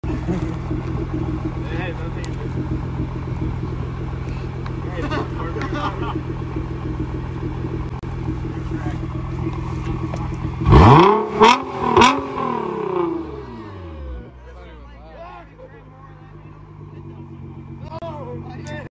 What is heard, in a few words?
nissan gt r; اگزوز